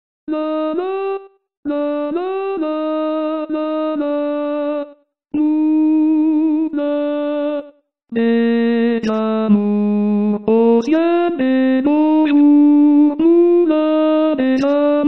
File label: Alto; Harmonisation d'Alain Langrée